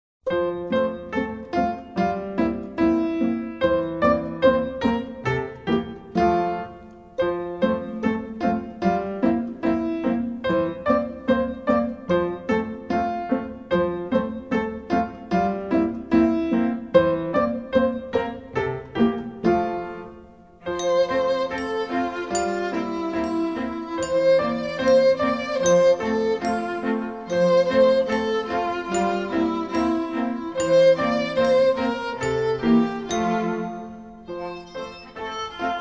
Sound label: music only; childrens songbook; Chinese